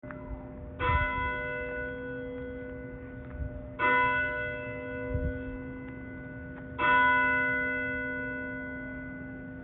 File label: castle bell